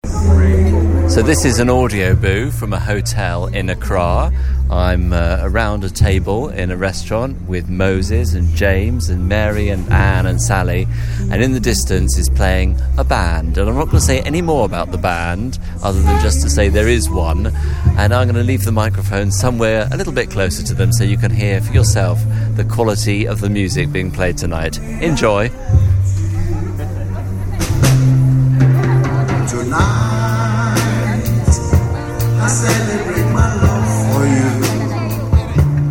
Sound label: ghana music